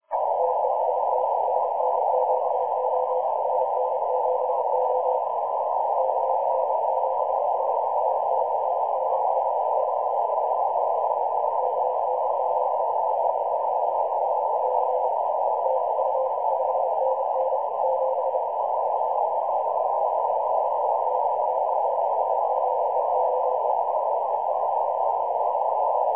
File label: websdr recording; K3FEF; 14049; spdxc 2018